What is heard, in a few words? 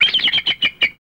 Bald Eagle Call